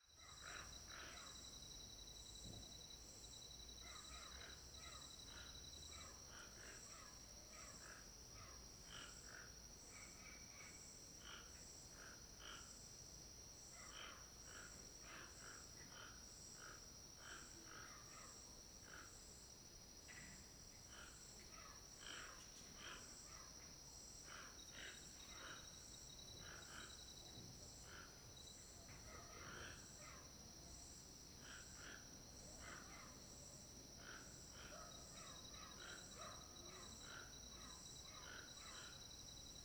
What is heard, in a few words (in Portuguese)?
Ambiencia de final de tarde quintal casa Alto Paraiso com Tucanos ao longe